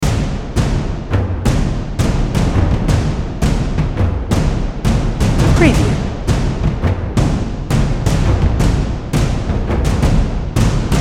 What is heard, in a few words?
Action Movie Drums, Cinematic Film Chasing Fighting Running Music